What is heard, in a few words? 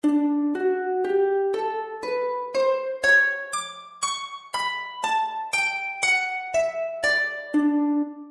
bell harp; AJ Hipkins